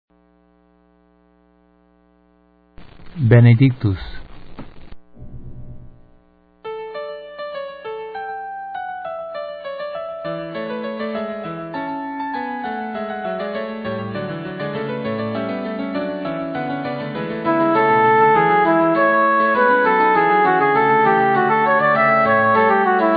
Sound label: benedictus alto